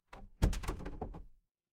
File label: door close